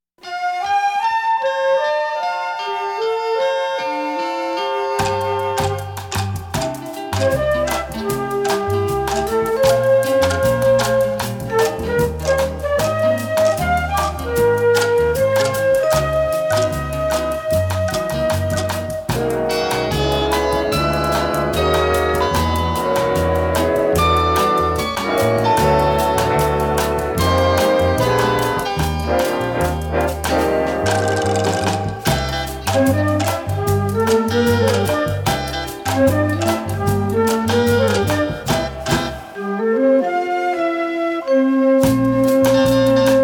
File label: Long Jingle Without Lyrics; Pams